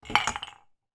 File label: household mug tree movement